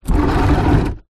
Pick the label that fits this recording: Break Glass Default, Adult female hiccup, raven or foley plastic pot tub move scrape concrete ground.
foley plastic pot tub move scrape concrete ground